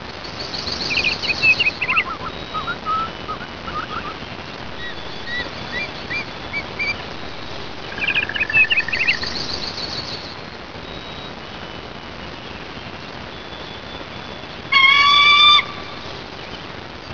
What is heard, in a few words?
forest